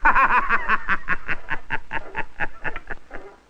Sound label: laugh lucifer